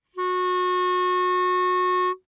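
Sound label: Eb Clarinet fortissimo Gb4; Woodwinds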